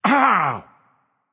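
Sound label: non verbal ouch, voice; cernunnos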